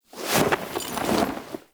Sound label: Camp Canopy Flap; Quests; Veilbreaker; Kahl Camp